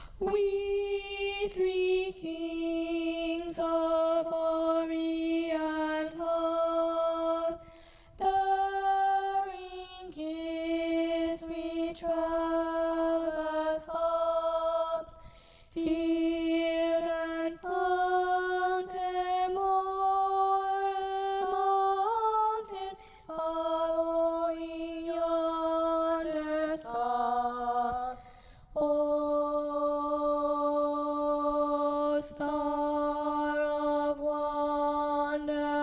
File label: Alto; Kings of orient